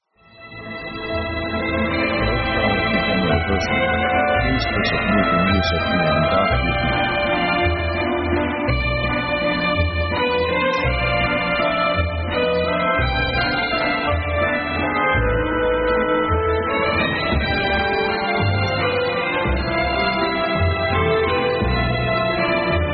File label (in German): walz, orchestra; orchestra themes